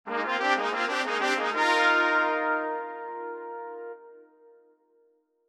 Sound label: Level Done Jingle; Gods Remastered; Gods Level Done Jingle 2